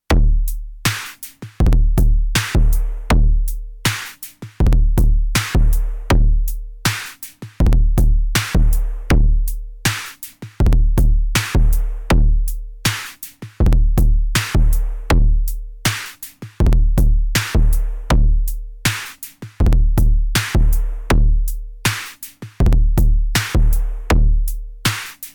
PO-32 Drum Force

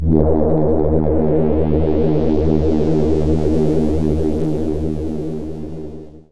Roland Jupiter 8 Wha Pad Dual

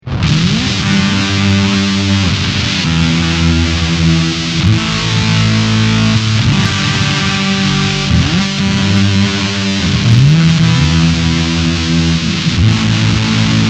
Full Blast

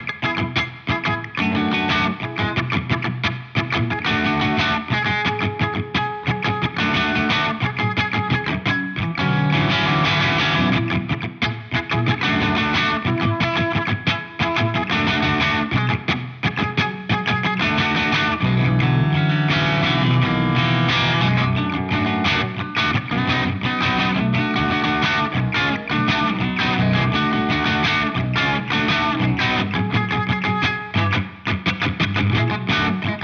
Single Coil Mode; Walrus Audio Ages

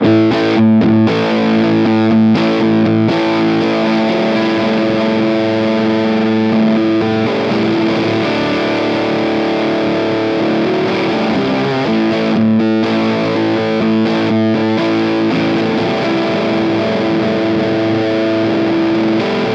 Seattle Fuzz; Guitar Rig 7

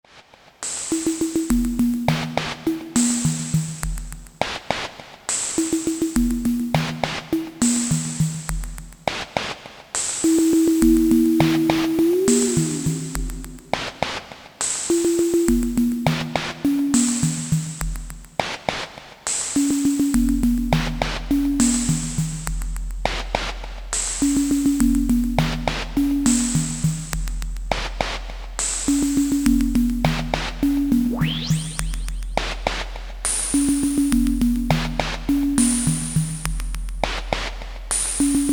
1010music nanobox razzmatazz Spacy Sounds

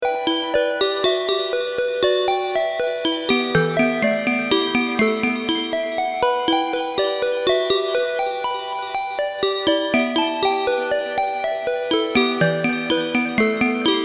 OB Xa Percussive

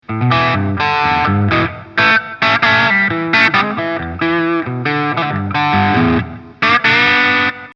TS808 full gain

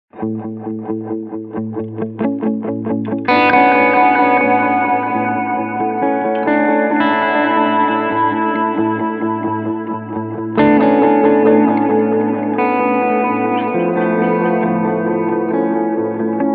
All Heads 800 ms

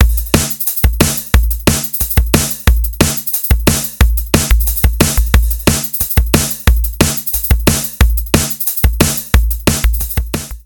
Compressed To F

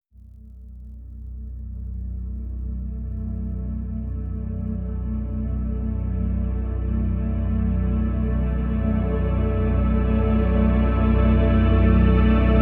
Dystopia Pad Adagio

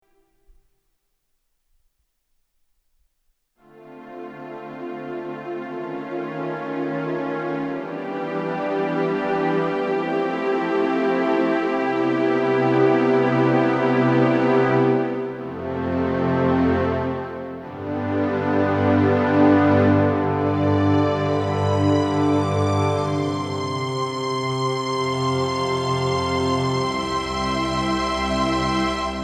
Kawai CN14 Slow Strings

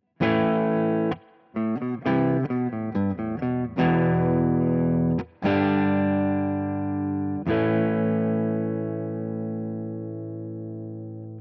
Boost

